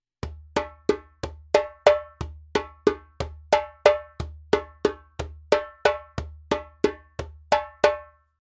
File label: djembe; djembebog oevelse